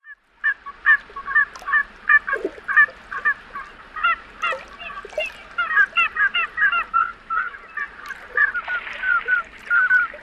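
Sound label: squeaky & musical calls of males, Surf Scoter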